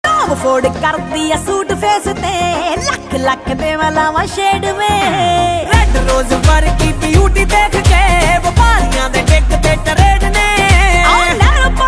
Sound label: Jatt Punjabi Ringtone